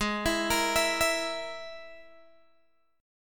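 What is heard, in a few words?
Ab Suspended 2nd Sharp 5th chord, Guitar, DADGAD tuning; arpeggiated; JGuitar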